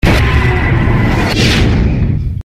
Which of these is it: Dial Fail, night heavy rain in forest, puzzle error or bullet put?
Dial Fail